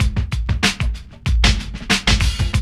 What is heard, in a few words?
LOOP; E-Lab; X Static Goldmine 2